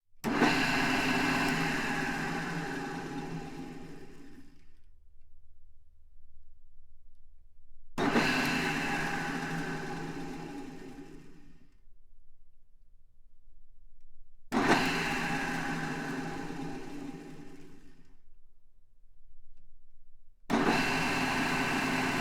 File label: large drill press